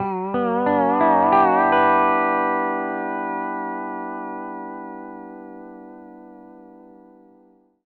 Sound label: LOOPS 140, Country